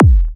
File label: Kick Punchy Trance Kick